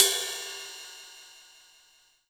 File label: Yamaha TG100 Ride Bell